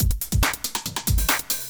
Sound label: TECHNO BEATS 140